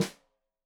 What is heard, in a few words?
Snare Stereo, Drums; Deeply Sampled Drums; SMDrum